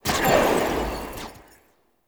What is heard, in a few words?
Dagger Proj Loop Start; Warframe; Weapons; Duviri; Incarnon Adapters; Incarnon Okina Prime